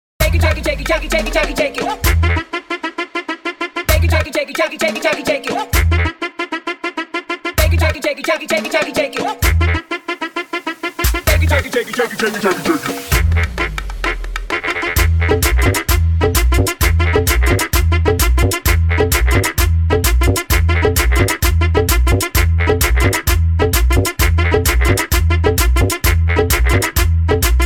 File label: SANDUNGEO 130 BPM